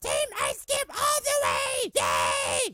jingle

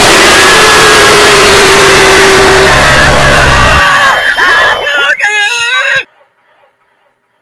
jumpscare

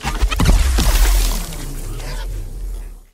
small attack; cryoclasm

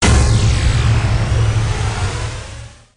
golden buzzer

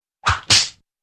swish and smack

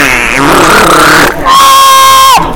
man scream

